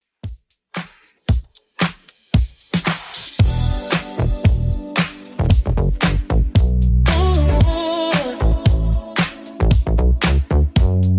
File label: 2ks Redrum 114bpm